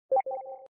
steam

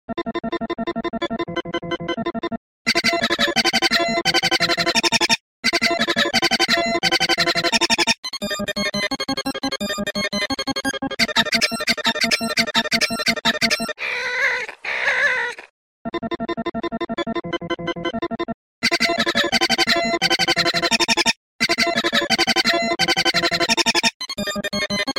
dog song

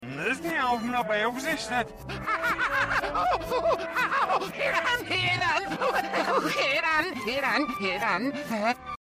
unwinding, reverse

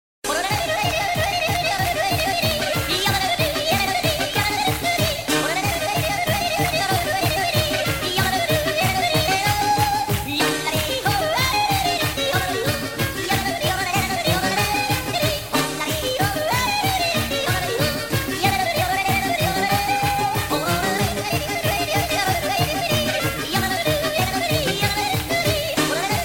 yodel

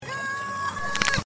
ahhhh

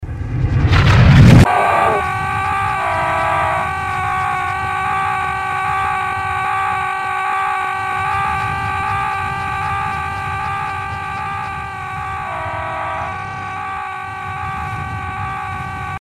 aaaa

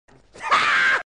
HAH

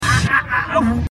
wahahahah

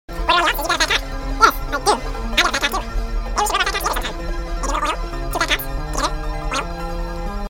office

